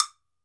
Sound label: TOKE BELL, African Percussion; E-MU Producer Series Vol. 7 – Old World Instruments